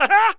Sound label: laugh; BESTSHOT; noisybit